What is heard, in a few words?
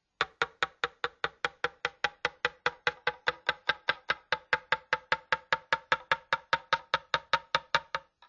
Plast cup with fresh cocoa powder